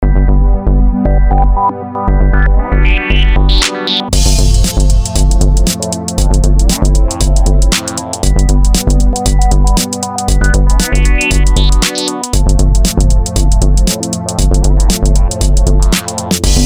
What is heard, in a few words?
beat; bpm:117